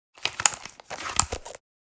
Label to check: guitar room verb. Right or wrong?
Wrong